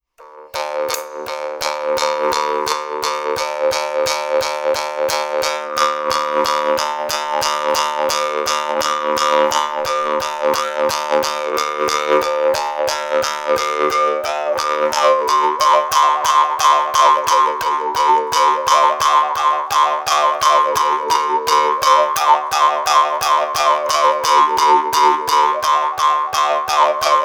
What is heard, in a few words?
mukkuri